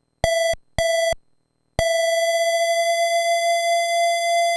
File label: detune, pokey